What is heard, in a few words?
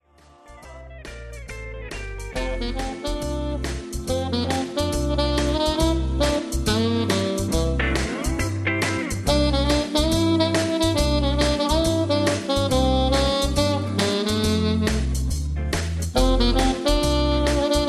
sax tenor